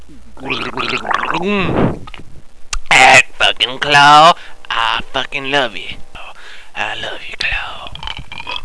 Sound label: gargle